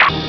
BOING

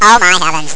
break; mechamoid

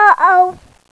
ERRORE

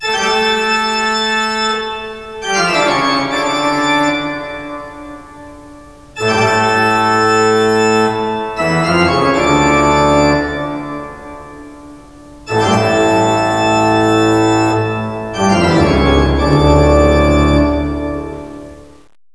TOCCATA

